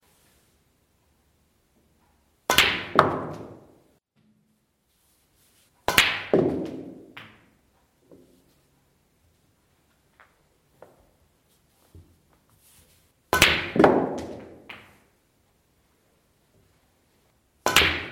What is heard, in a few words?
soundscape snooker practice quite loud